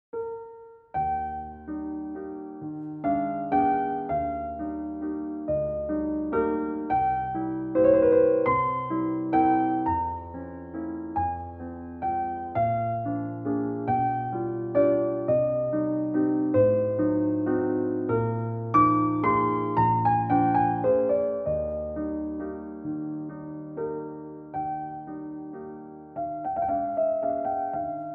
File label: Romantic Grand; dexibell